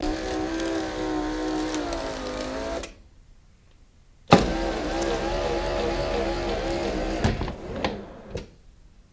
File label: Sunroof Elect Open Cl